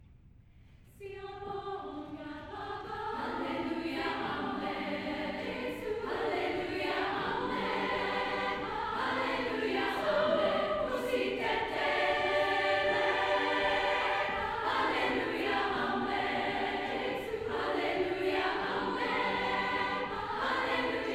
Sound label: South African Siyabonga